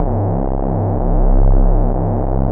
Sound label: Dance Floor Bass, Multisample Basses